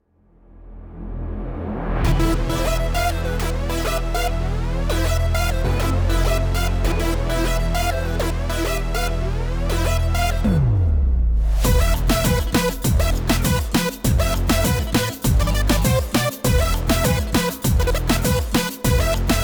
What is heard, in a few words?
Radio Reggaeton; Gangstar West Coast Hustle; Android